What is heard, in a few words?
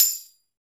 PRC TAMB